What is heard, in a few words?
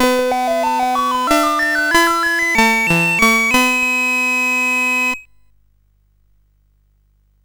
Buzz Off, CSG8580R5; GAMES